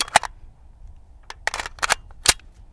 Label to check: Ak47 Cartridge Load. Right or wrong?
Right